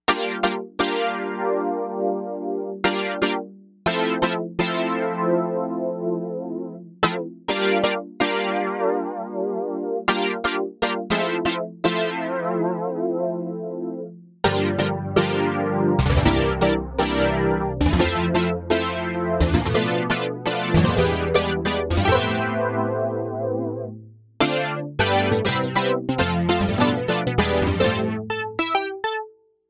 Classic Poly, Novation UltraNova; Factory Patches

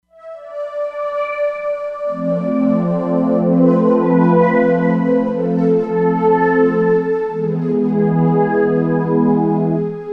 Flute Trnsfrm, E-mu Proteus-3; Factory patches

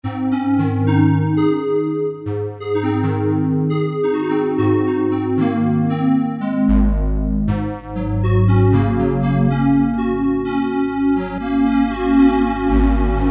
PROPHET SQUARE 2

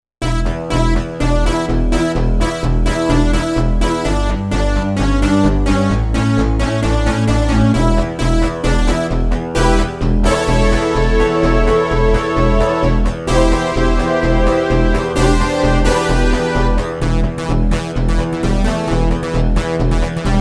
SuperSynth

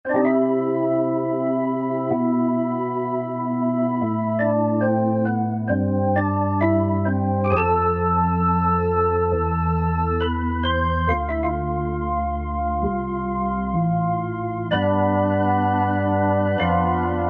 Proc Har RS, Korg MSC-9S Organ

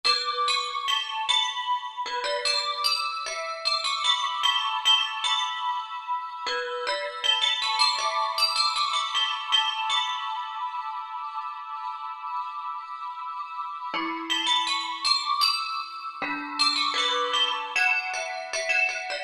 Bells, Korg MSC-10S Ethnic

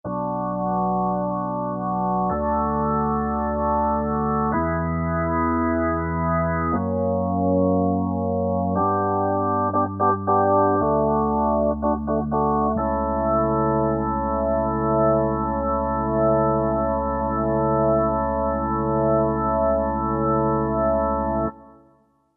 Korg MSC-9S Organ; Deep Bx+RS

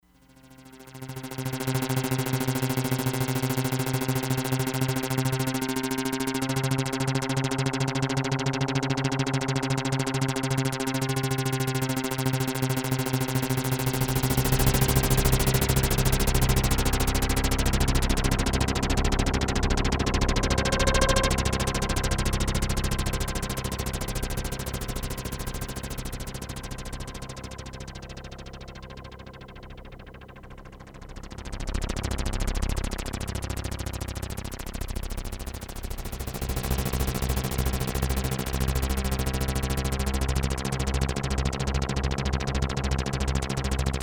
HELICOPTER, Crumar Bit 99; Factory Programs

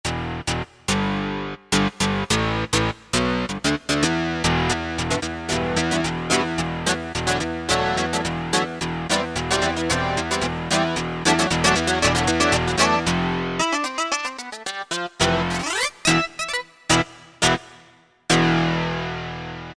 Wire, Korg T3

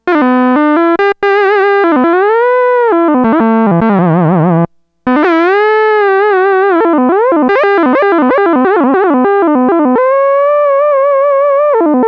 ELECTRIC GUITAR, Moog Micromoog

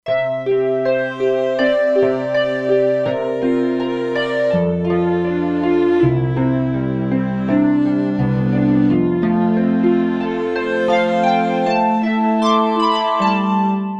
Harp strings, E-mu Proteus-2; Factory Presets

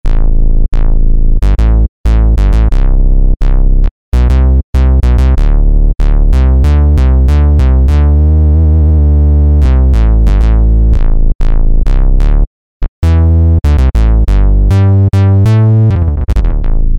Synth Bass; Guess the synthesizer game

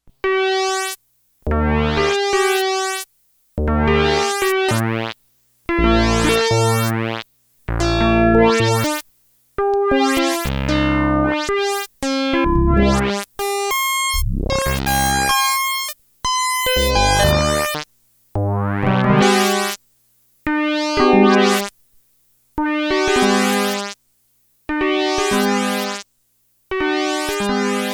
Sawtooth Arpeggiator

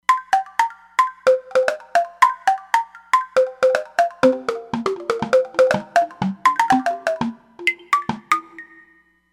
Xylosizer, Roland Fantom XR

